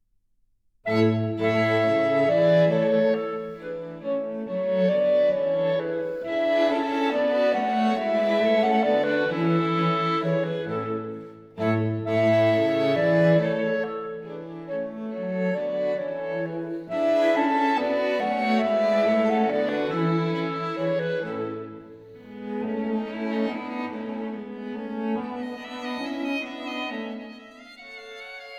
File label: Clarinet Quintet in A major Menuetto Trio; KV58 III.